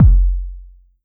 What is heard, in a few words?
Yamaha FS1r BoomTchak Kick